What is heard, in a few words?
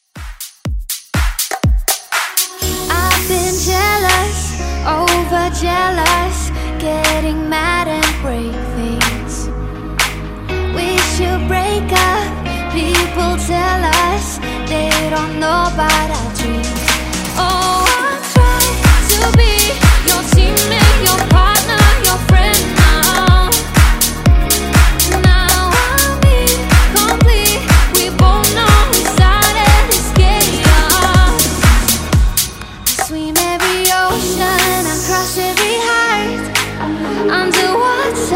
Pop Dance; Redrum